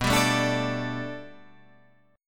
B-Minor 7th Sharp 5th B down; strummed

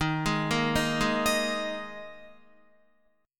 Eb Suspended 4th, Guitar; Ebsus4 arpeggiated; JGuitar

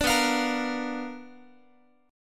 B Minor Major 7th Flat 5th Guitar

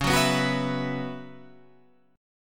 B Suspended 2nd Suspended 4th Db

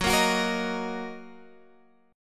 Fsharp 6th, guitar chord

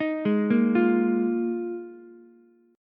Eb Suspended 2nd Suspended 4th Eb Ukulele; Ebsus2sus4 arpeggiated; JGuitar

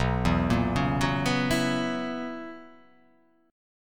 B Major 7th Suspended 2nd Suspended 4th B

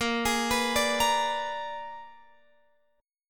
Bb 7th Flat 9th Bb, Guitar Open F; Bb7b9 arpeggiated

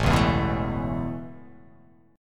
A Major Add 9th A, chord

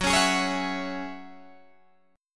Gb 7th Suspended 2nd Gb Guitar Open D

